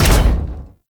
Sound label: Jump Landing